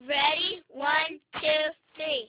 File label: camera Beep; Siemens SL91